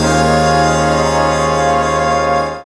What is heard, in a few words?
HIT Band, Big Band Hits; Club-50 - Foundations Roland; Hit Combo